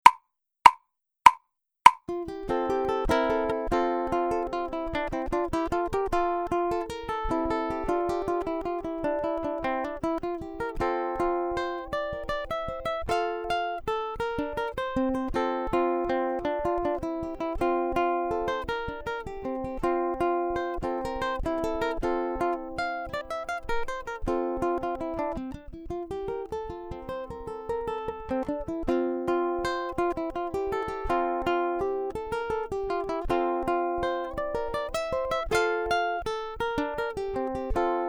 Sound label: Canarios, ukulele; grade6